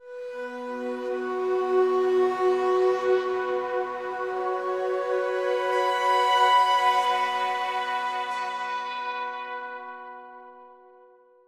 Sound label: Synth B Long